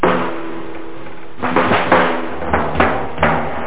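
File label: SNARE LOOP, DRUMLOOP; Groovy Bytes: Behind the Moon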